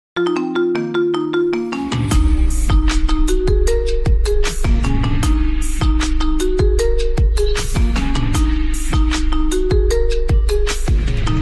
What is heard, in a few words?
Marimba Remix